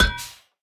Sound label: tower rocket Gunners unit Spawn